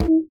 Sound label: Impact boomph; Ed Edd n Eddy; Adromulus Pack; EEnE